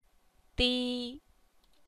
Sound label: tone